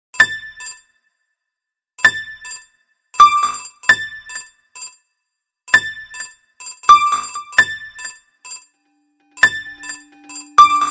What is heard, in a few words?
nokia new electro